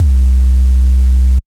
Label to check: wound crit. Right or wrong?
Wrong